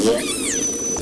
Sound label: orca